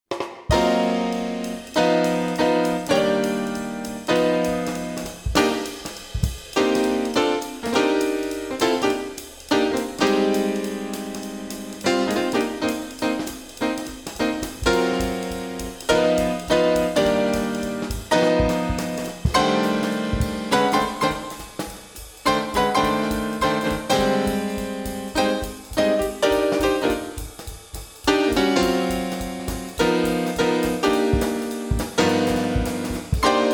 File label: C Minor Blues (for Bass Auditions); jazz audition